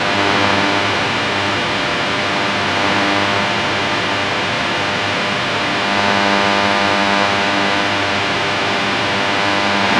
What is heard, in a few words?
radio static sound